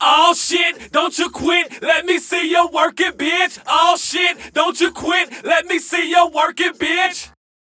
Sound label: VOX